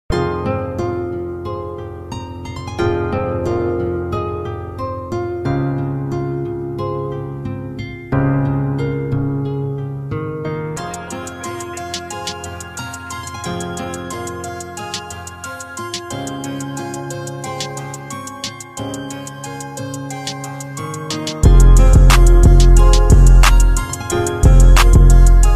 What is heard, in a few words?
instrumental; Bandit